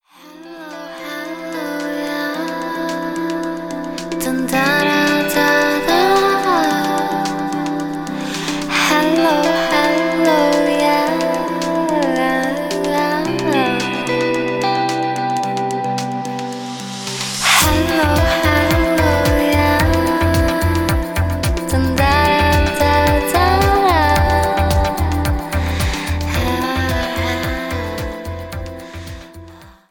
huawei hello, ringtone

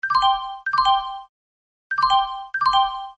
railway tone

